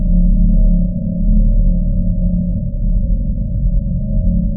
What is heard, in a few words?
abstract air, tones; source engine; portal2; ambient